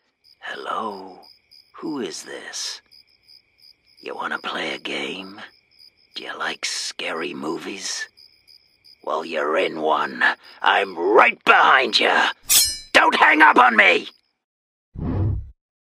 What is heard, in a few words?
scream